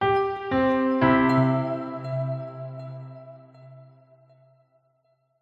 multimedia musical logo warm trusted safe piano warm pads; zapsplat